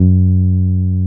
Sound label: TB 303; VEL SW SET